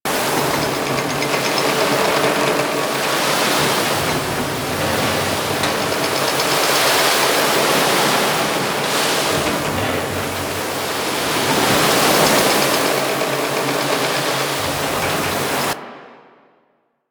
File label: Hourglass Sand Fall Loop; Octopede; Warframe; Man In The Wall